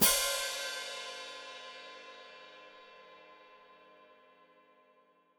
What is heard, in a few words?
Hat Closed Open, Hi-Hat